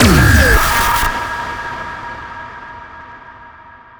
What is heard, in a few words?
blast